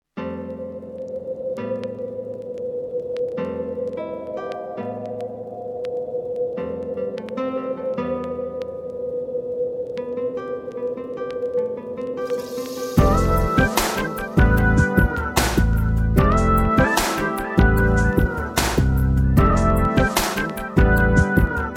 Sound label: synth; clean